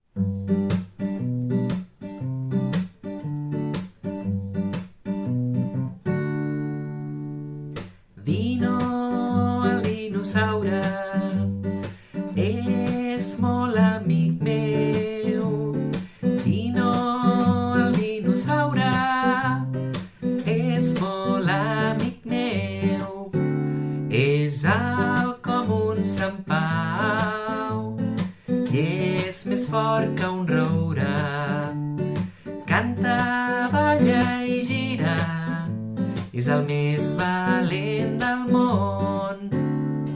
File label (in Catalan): Cançó infantil acústic